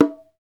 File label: BONGO, Roland; Hybrid Kits; Club-50 - Foundations; Thin